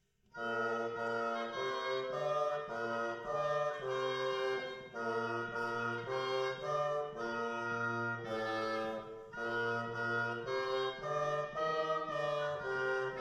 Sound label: double reeds, Yankee Doodle